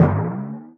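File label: timp boing, timpani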